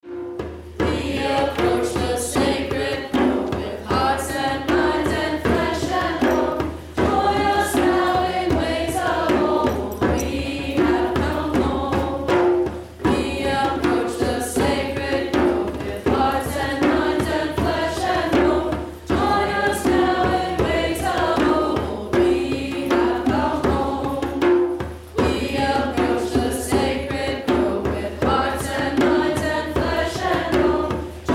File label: chant